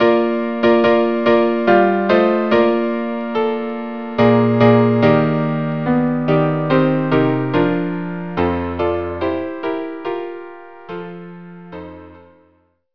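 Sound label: string quartet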